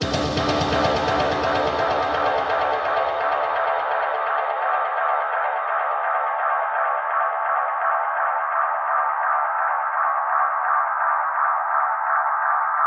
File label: Reverb Athmospheres, FX Vocals; Vengeance Samples